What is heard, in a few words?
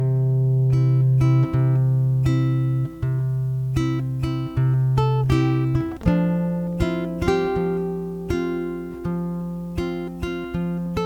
Acoustic